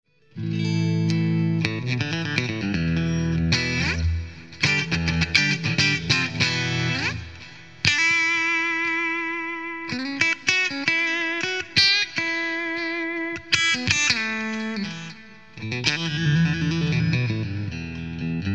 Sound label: Bridge Clean